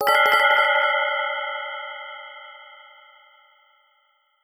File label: Heaven Blessing